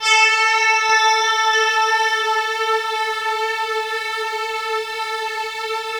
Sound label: Dream Pad (single note)